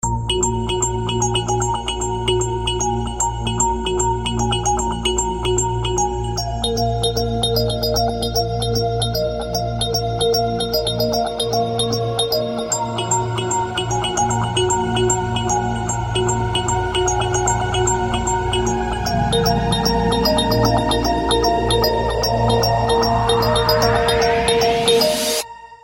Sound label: Bells